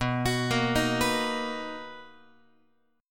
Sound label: B Major 7th B down Guitar; arpeggiated; JGuitar